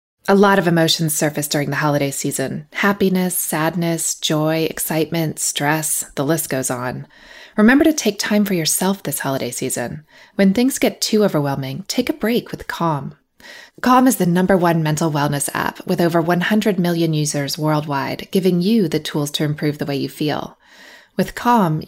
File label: Calm Mid